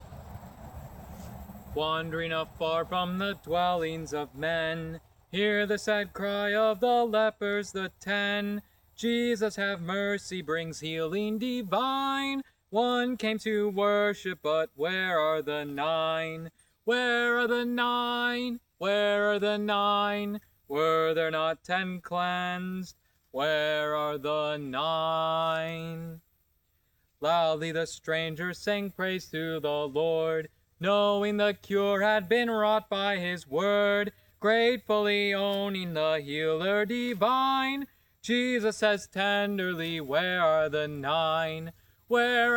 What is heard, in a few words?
Where Are The Nine Singing